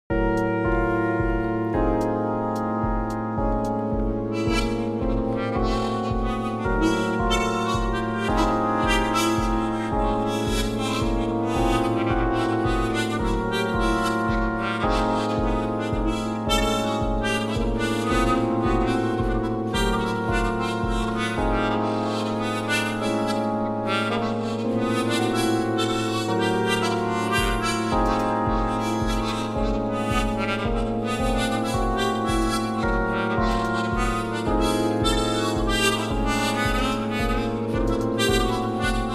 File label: electro folk